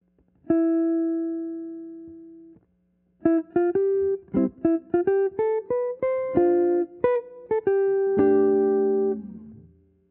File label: Key Center E